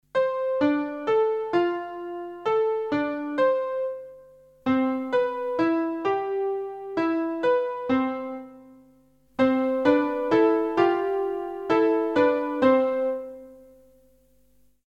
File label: Scale Illusion; Deutsch